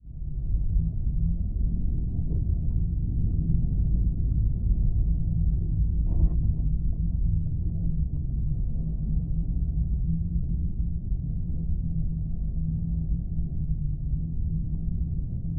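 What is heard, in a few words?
Hydrophone, Underwater, Waterfall; underground sounds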